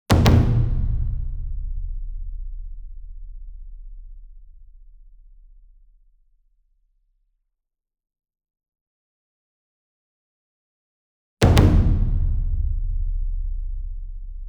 SINGLE DRUM Taiko 60 Inch Double Hard Beater Medium; boom library; Cinematic Strikes Construction Kit